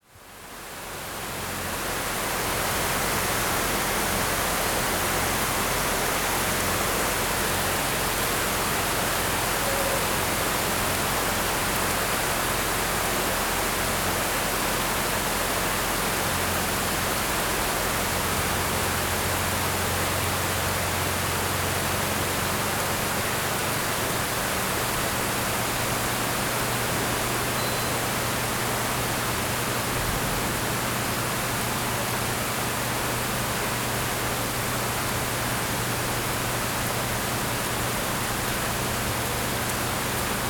Engine and storm, Bangladesh; Les Cartes Postales Sonores; NOISE ON EARTH Vol2

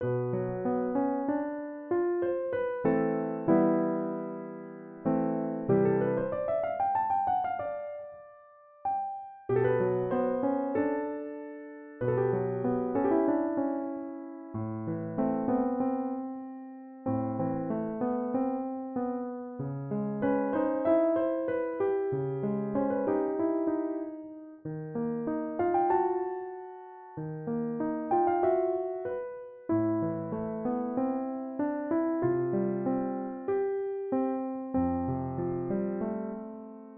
Piano Music

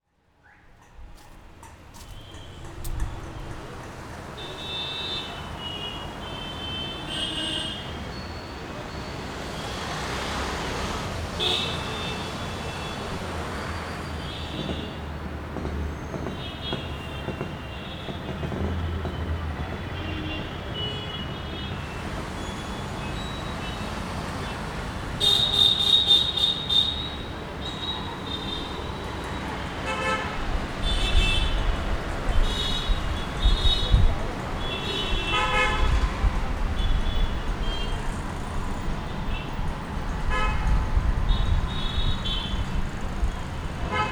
9 Wood Street Bangalore, Environments; Samuel Corwin; Selected Field Recordings from India and Nepal

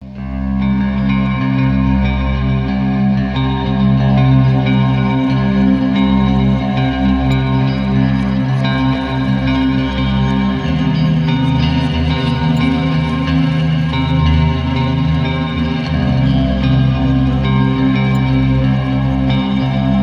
Spooky Dark Delay Western Guitar; CUES for film TV games etc

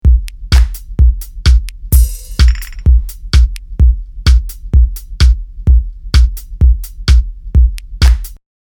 128 4 4 beat; Loop Mania Sampler Pack

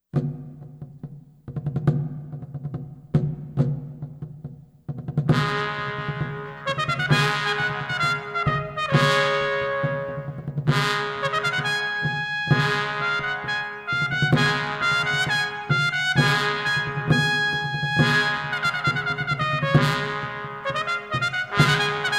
Monteverdis Toccata, Fife and Drum Corps; from LOrfeo; The United States Army Old Guard Fife and Drum Corps

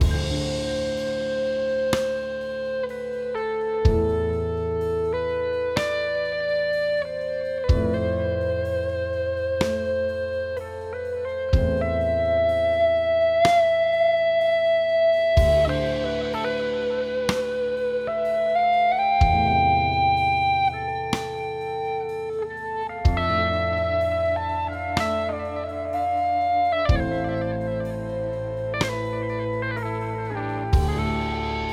Calm Gentle Sad Emotive Piano Drums Guitar solo

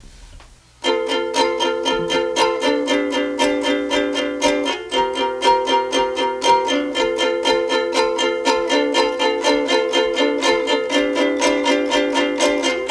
Ukulele

